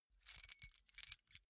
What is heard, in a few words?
key ring small rattle